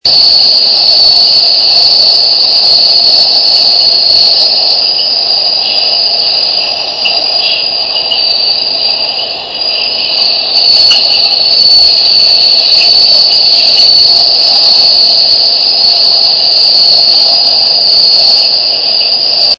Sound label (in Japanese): suzumusi